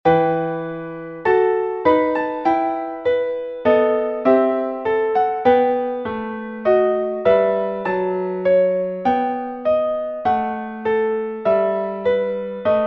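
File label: 3 fingers 3 voices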